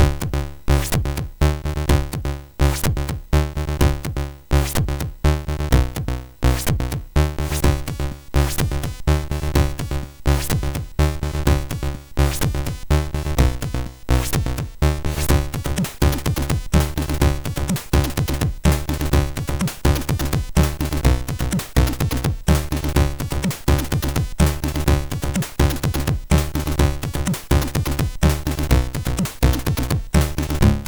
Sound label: sid, MOS6581R4; Noise 2 Joys